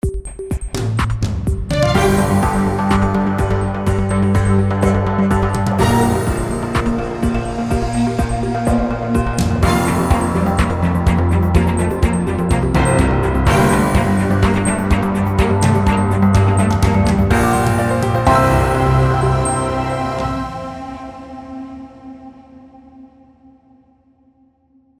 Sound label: news breaking news